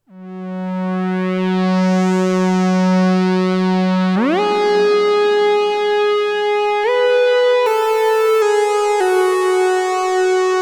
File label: Synthesizers Lead Reverb